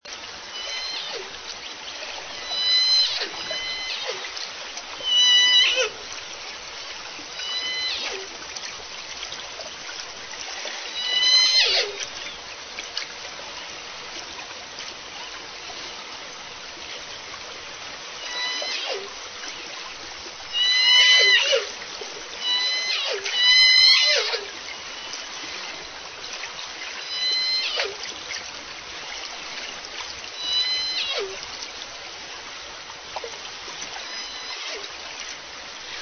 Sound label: overlapping